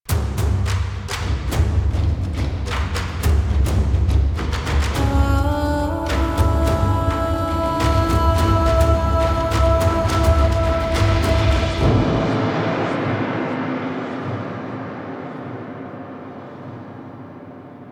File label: Clan Rank Up, Music; Warframe; Dojo